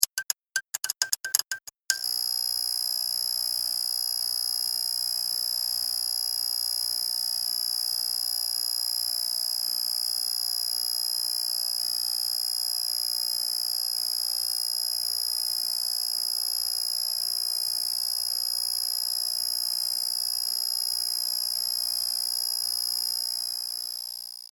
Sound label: Electric Fluorescent Light Buzz; A flourescent light clicks to life and emits a high pitched electric buzz.